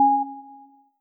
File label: Timer Start